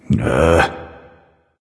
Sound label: "ugh"; smite; voicepack; ullr